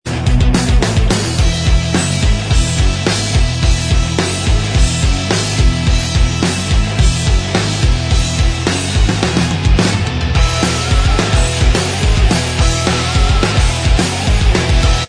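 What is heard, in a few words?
punked up instrumental track, backing tracks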